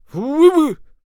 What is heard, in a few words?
Protector Attack Vocals